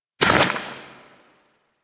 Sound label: Goo Gun Tail